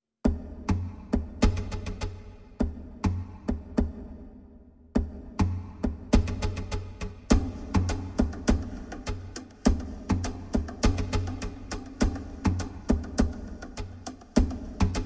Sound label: perc only